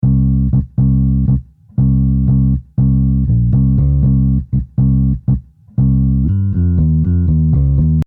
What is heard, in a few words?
Bass